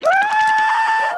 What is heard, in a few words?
skull phone scream